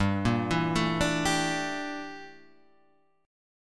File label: G Minor 6th Add 9th G down Guitar Half Step Down; Gm6add9